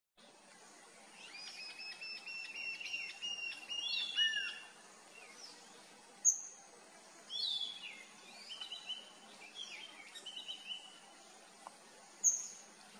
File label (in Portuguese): Canto do ariramba da capoeira